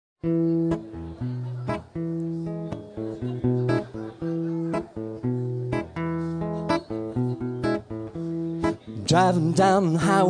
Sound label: shuffle; MC Shuffle (KC Shuffle)